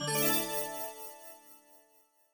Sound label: start; NVDA; Cubic